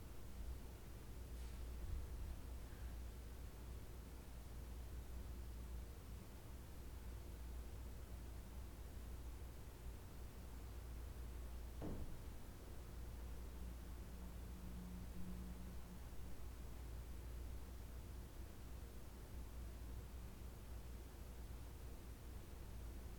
House Room Tone

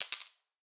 Bullet Shell Falling On Concrete Surface

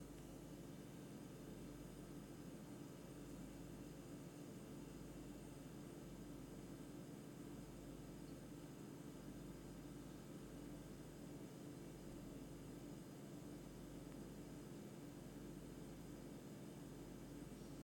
Fridge Hum

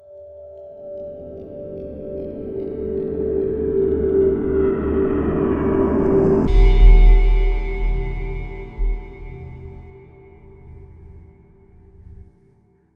Reversed Sound Logo

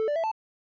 Level up

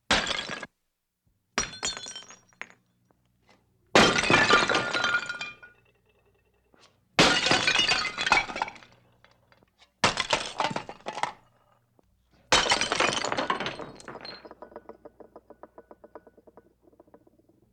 Pottery Fall And Breaks Several Takes

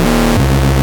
Wrong Answer

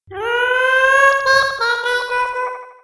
Sound Alien

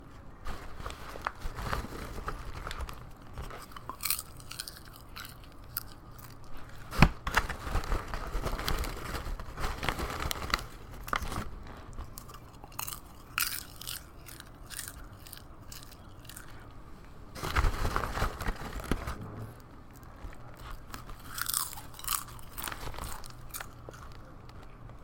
Eating Something Crunchy Popcorn

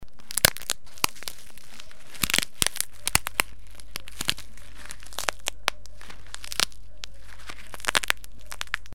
Bone Cracking

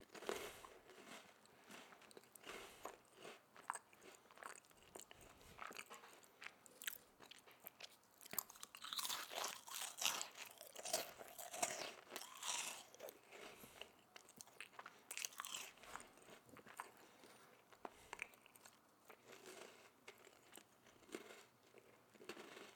Eating Human

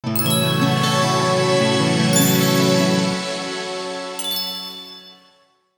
Magic Transition Ringing

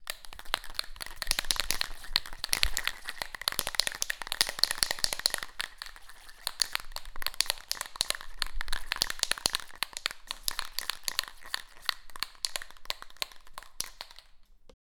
Spray Shaking

